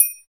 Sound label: BEL TRI, TRIANGLES